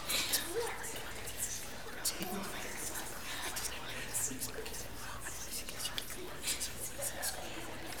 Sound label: WHISPER; WHISPERS